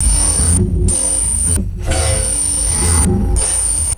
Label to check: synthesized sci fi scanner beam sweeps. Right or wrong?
Right